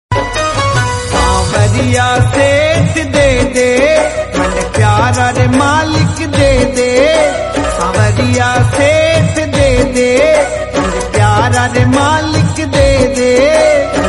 krishna bhajan

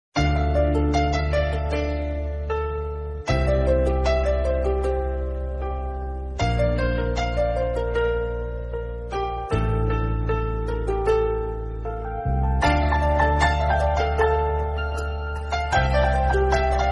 bgm christian tamil

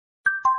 notification

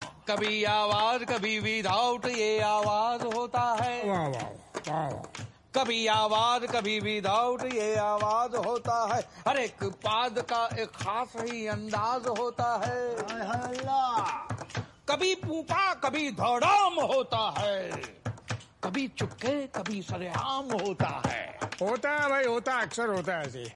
haryanavi song

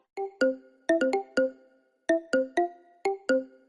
tam tam

